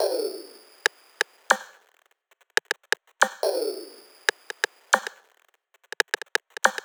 140 bpm Perc Loop, Dubstep; Ideology

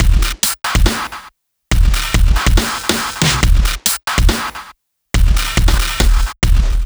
Share Break, Bpm 140, Dubstep, Drum Loops

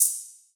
Shaker, Dubstep One-Shot Drums

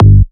Bass Shot G

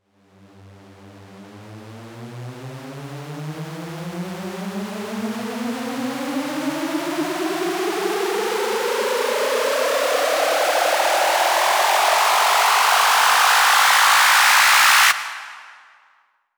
FX Uplifter

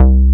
Bass Shot C

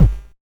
Kick

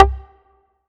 Synth Shot C

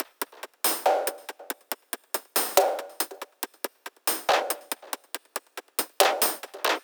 140 bpm Perc Loop, Dubstep; Modul

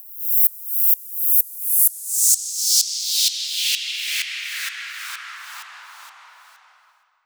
FX Downlifter

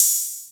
Open Hihat; Cymbals; Mutekki Media; Vengeance Electroshock Vol.2

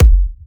Dirty Dutch Bassdrum; Mutekki Media; Vengeance Electroshock Vol.2

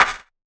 Clap, Dubstep One-Shot Drums

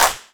Claps & Snares

